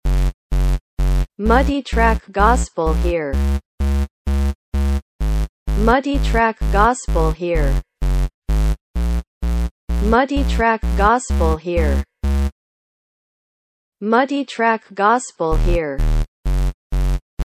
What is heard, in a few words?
BASS SYNTH